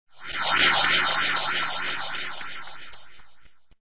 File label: space ship